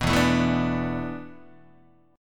E 7th Suspended 4th, Guitar; E7sus4; strummed; 2,0,0,2,3,1

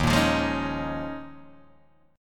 D sharp 9th Suspended 4th D sharp, Guitar Open G

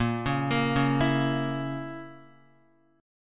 A sharp Diminished Guitar, chord; arpeggiated; JGuitar

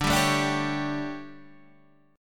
D Major 7th Suspended 4th, Guitar, Standard; DM7sus4; strummed; JGuitar

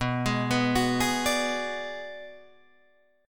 B Minor 6th B Guitar Drop D, chord

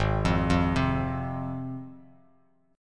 Ab 6th down Bass Standard; arpeggiated; JGuitar

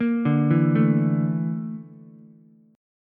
A sharp Major 7th Flat 5th A sharp Ukulele; A#M7b5; arpeggiated; JGuitar

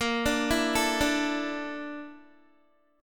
A sharp Major 7th Flat 5th A sharp Guitar; A#M7b5 arpeggiated; JGuitar

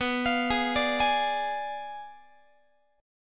B Diminished 7th B down Guitar; arpeggiated; tuning 4A,4E,5A,5D,5G#,6C#

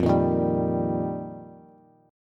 F 7th Suspended 2nd Suspended 4th, Bass; F7sus2sus4; strummed; JGuitar

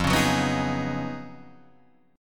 Db 11th F, guitar

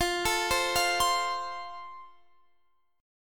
F Major, Guitar Standard; arpeggiated

